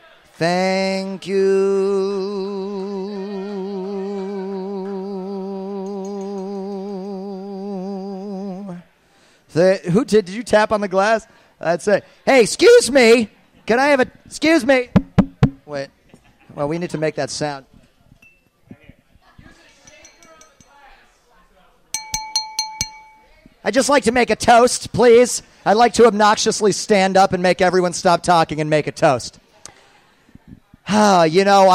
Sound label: Keyboard; Live Performance Stream 2018-06-10